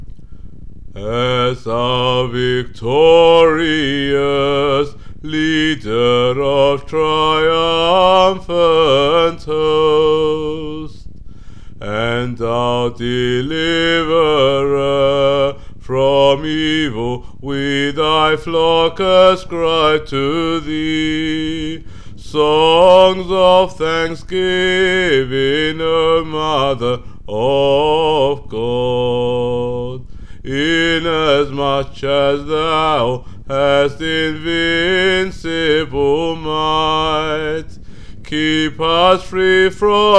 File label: VOICE Tone 8; As our victorious leader